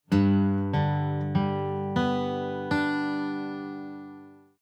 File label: arp horn